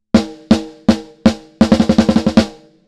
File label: HIGH pre Low pass 36dB oct; Kelvin